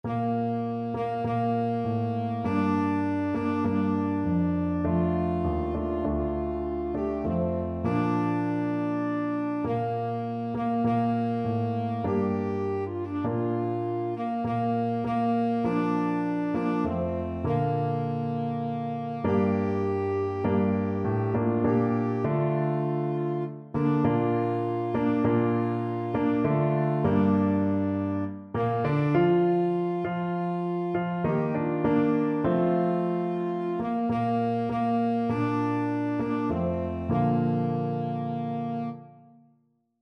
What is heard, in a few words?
tenor saxophone